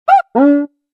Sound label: cuica instrumento